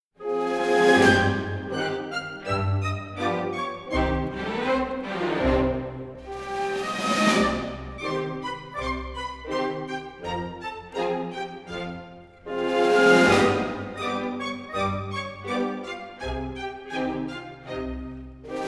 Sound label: Adage Female Variation